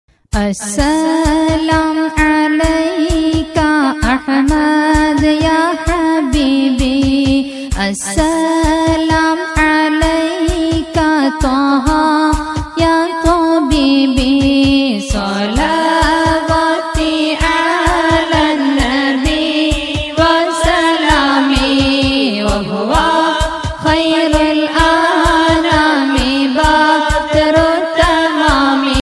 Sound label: SWALATH BY FEMALE RINGTONE